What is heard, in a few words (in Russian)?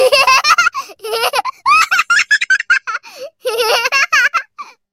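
rebenok smeetsya ne mozhet ostanovitsya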